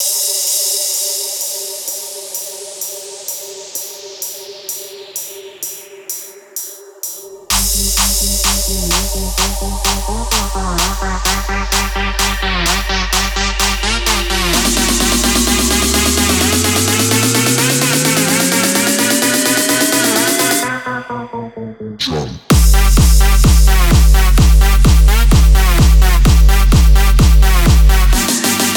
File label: Kit Crazy 128 Bpm G Full Mix